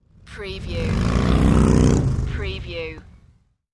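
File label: Motorcycle Drive By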